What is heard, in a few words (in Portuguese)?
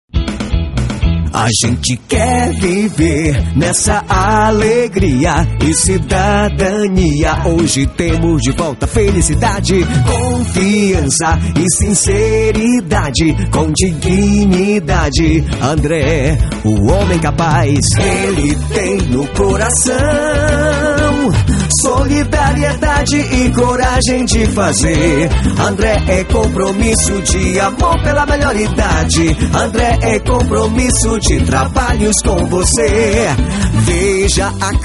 Jingle politico